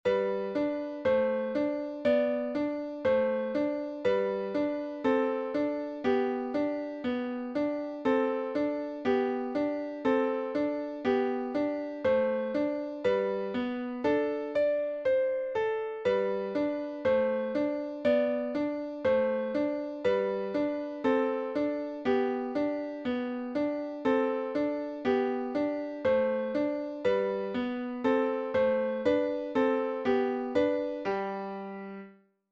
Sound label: Melody in G Major